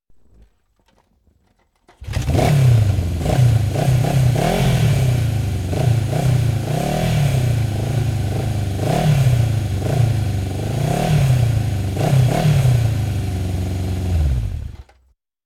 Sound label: Walking Machinery Trucks